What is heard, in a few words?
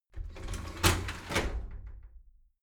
shower slide door inside open regular